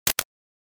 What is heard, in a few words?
UI invalid sound